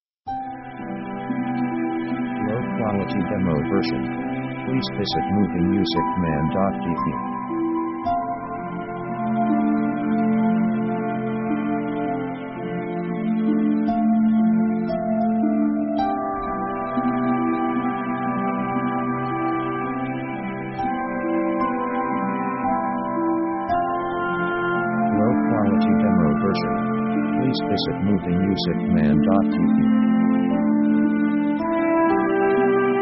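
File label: orchestral traditional romantic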